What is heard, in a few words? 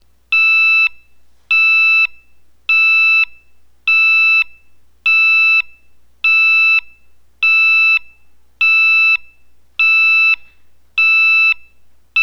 SLOW PULSE, Piezo Siren